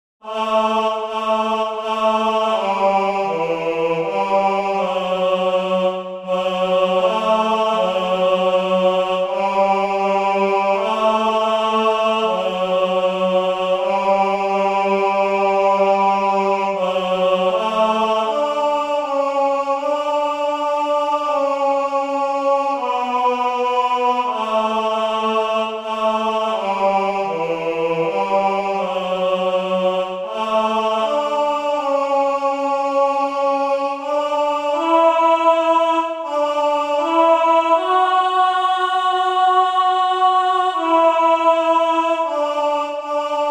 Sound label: tenor